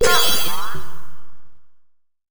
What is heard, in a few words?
notification low cohesion; Stellaris; federations